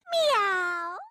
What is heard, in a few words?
Meow; Ibuki